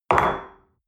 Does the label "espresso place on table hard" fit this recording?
Yes